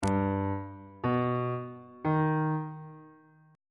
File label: f double sharp augmented triad chord intervals on bass clef